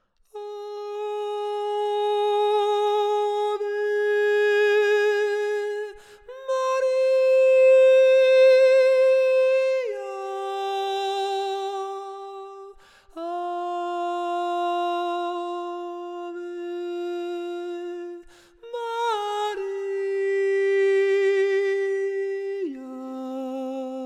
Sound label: ave maria caccini mezzo